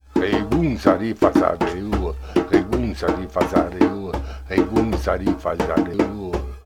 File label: drum voice